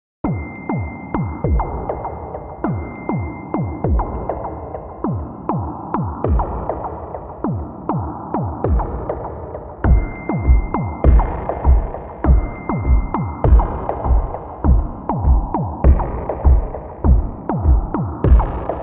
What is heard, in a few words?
loop; hephaestus